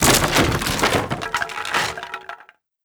wood gib; Rust

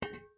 ui drop metal hollow large; Rust

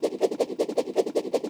hatchet stone thrown flight; Rust

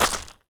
gravel walk

